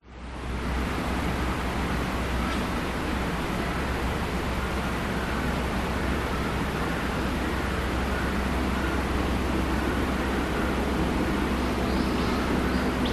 nature forest distant traffic dist river dist dogs wind trees